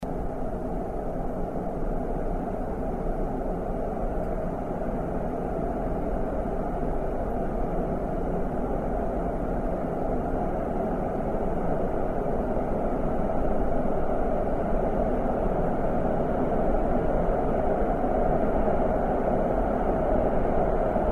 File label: train noise